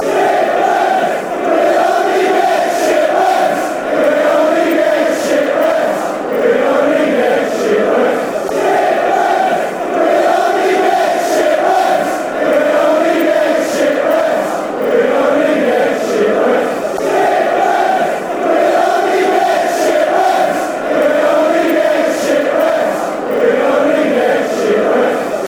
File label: chants; sit refs